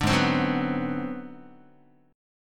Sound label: Ab 7th Sharp 9th Flat 5th Guitar, chord; Ab7#9b5 strummed; JGuitar